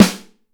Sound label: Snare; Ableton Live 9 Essential Training